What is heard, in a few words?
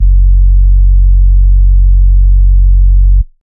Sub F#, Dubstep; Bass One Shots; Ghosthack; Dubstep Sound Arsenal; 50 Bass One Shots